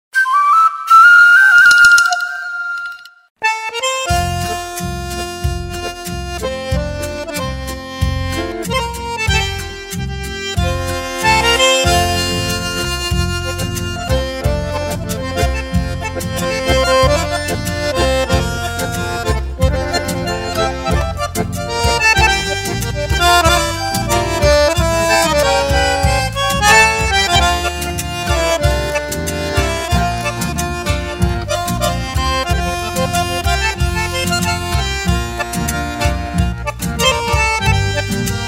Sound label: Chotis Instrumental Bandoneon